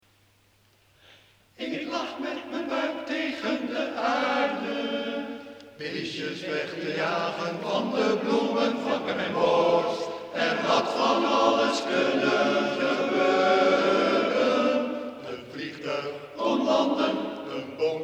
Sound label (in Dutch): Zang en Vriendschap Koor